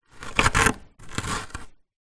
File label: household ice frost build up scrape inside freezer